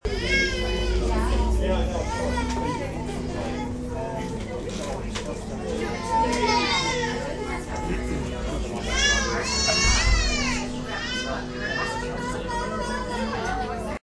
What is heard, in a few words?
peace and tranquility in starbucks with a wailing baby chorus